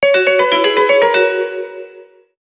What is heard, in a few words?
Alert; PlusFourSix; Patti; Lars Edvall